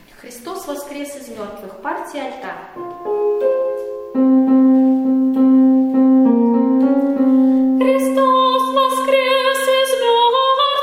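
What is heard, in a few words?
alto; epiphany; Xristos Voskres Iz Mertvix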